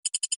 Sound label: Drill; ESAN VO Sound Schemes; ESAN VO Sounds Pack